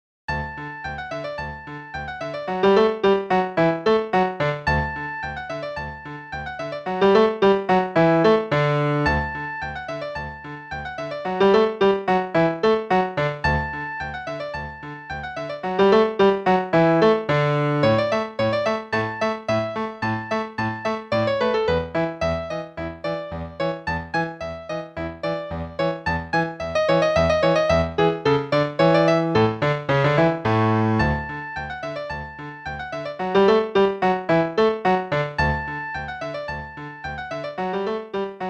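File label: Play-a-long, Musette, quarter note=140; Bach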